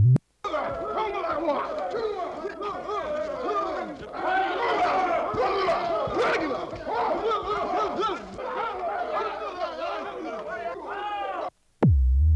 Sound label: Men Yelling While Fighting